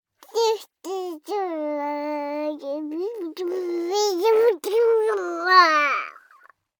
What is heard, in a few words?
BABIES BABBLE Girl 12 months Spittle; Baby Boom